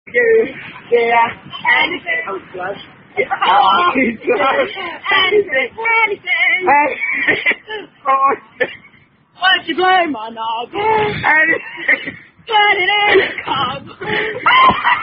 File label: In The Park, Song